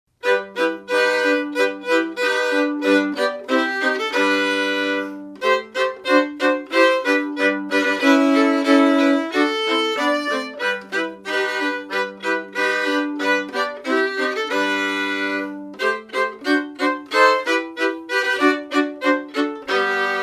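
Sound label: Violin Trio; JBells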